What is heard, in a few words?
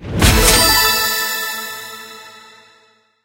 extra multiplier; rome